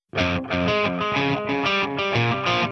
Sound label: rock sms